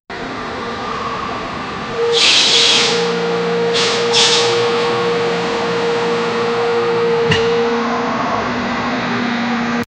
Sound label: bus sound